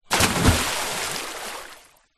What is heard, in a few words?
sport child swimmer jump into pool